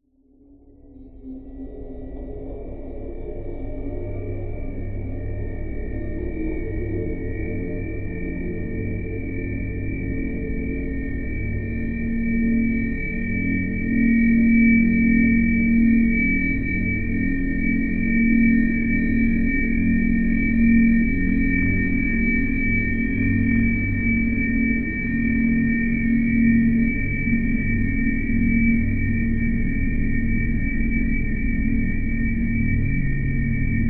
science fiction atmosphere droning whining electric; zapsplat